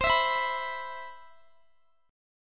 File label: C Major 7th, C, down, Guitar, Open D